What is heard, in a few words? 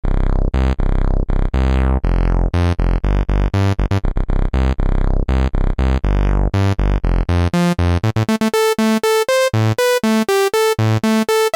ensoniq fizmo filter rez sweep